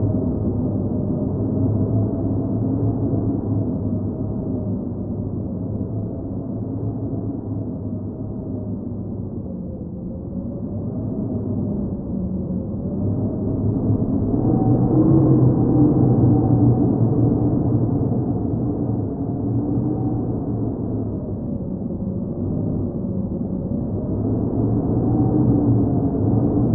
Underground atmosphere - Wind - Loop

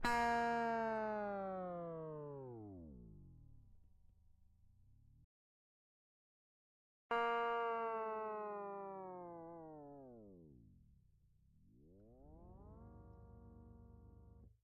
Open String Dive Bomb Down, Whammy bar, Low; Rogue Waves; Metal Tensions

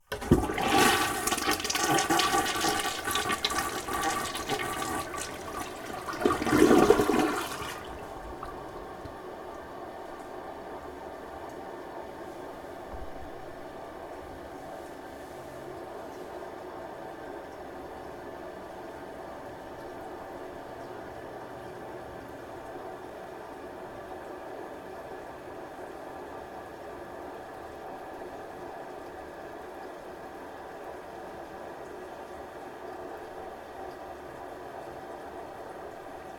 Toilet, Flush Cycle; UberDuo; The Mountain Townhouse Audio Playset

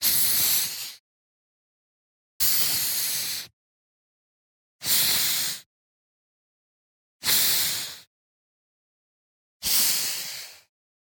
Steam Release Short; AIRBrst; Mono; Justsoundeffects; Steampunk Gadgets

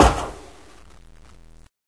foot heavy, antlion guard; hl2

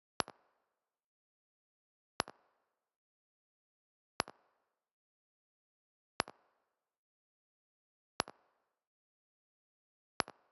UI Sound Pack Scroll; Kpow Sounds

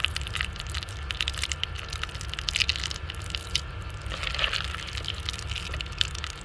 movement, antlion grub; hl2

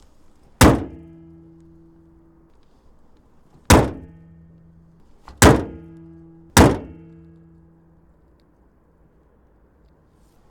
Nissan Qashqai I 1.6 Foley EXT - hood close; mono; Car Foley; Soundholder

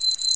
warn, roller mine; hl2

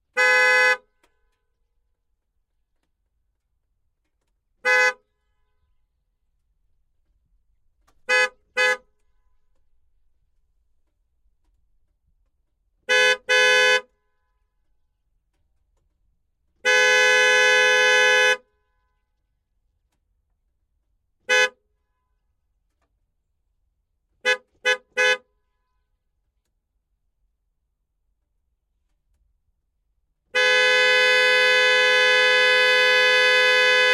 Dodge Viper GTS Race Engine - Horn Various; Dodge Viper GTS V10 Race Engine 2014; MS Decoded; Pole Position Production

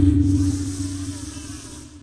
pod hit; hl2

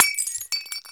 small glass, bullets impact; hl2

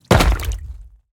creature footstep damp; Cave Creature Sound Effects; Bluezone

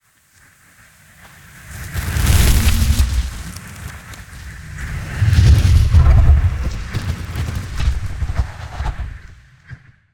Designed Fire - Swooshes - Burst, evolving

